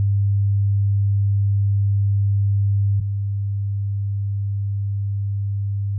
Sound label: soundproof effect 0100hz; 遮音(防音)シートの防音性能; 2枚重ね